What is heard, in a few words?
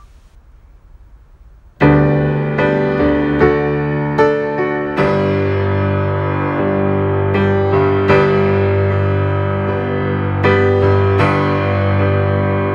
This Little Light Of Mine Instrumental; kids music library